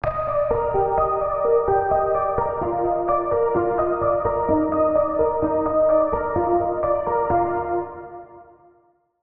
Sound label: Key; Sync Rotation; Routed Sound; Vital Expansion - Star Scavenger Vol.1